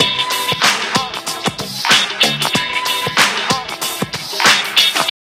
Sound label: Loopy Lounge; Pantech Breakout